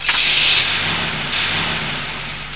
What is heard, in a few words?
auto; winpolis